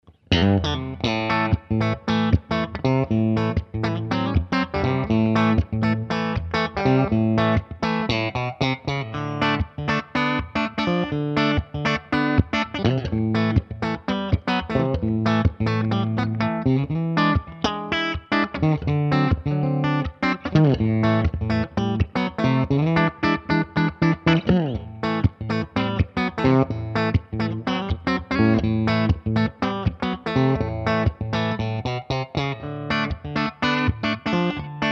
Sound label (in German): Fender Vibroverb Reissue Tuning, Bright Kanal mit Fender Stratocaster Japan, Reverb 9Uhr; Clean Pick